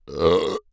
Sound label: burp; OPENAIR; Anechoic